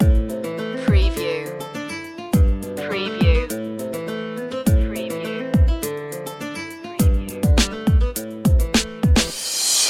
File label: global flamenco loop